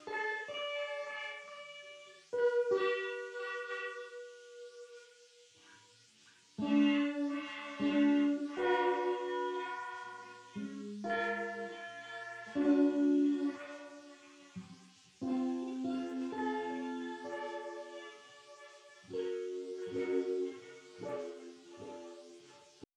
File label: guitar tones chords; Crowdsourced Sample Pack; TRUTH-HZ